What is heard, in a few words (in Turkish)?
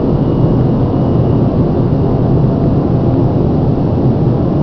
uçak motor; tasitlar